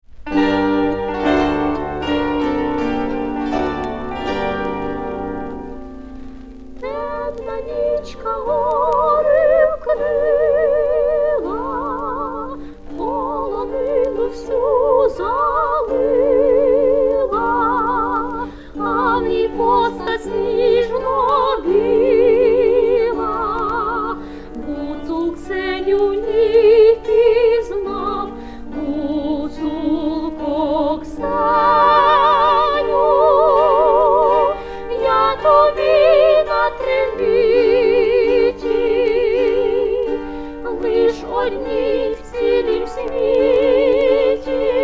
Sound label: female bandura trio